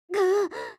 FEMALE damage

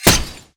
knife metal, Damage

